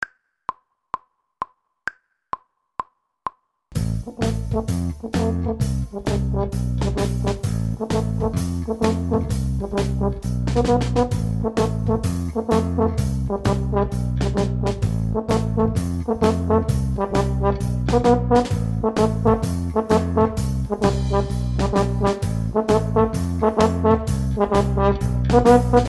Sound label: horn